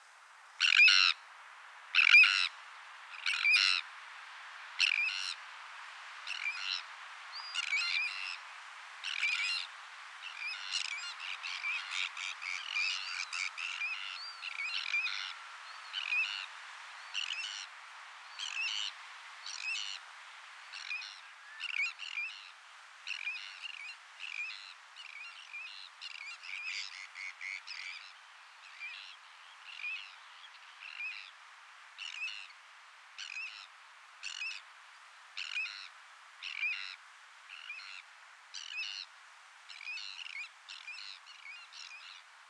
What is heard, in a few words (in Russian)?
pestronosaya krachka golos